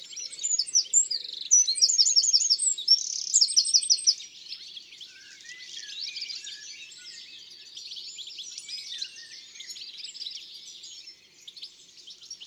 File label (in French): Troglodyte mignon; ABC des chants d'oiseaux